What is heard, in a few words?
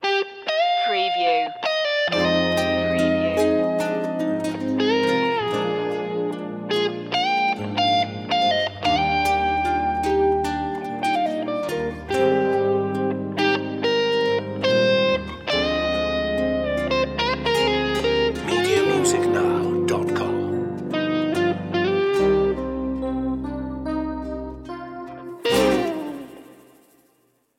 mediterranean guitar